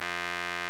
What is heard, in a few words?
RMI EP; Sonic Reality - Vintage Timetraveler